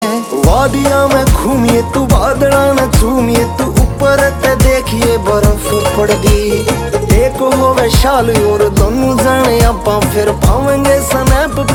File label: Ringtone